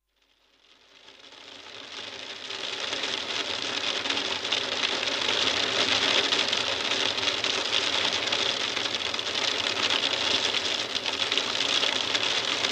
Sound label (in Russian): zvuk grada iz doma